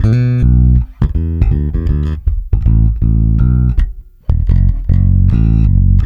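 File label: shuffle B, bass; Spectrasonics; Bass Legends; SHUFFIT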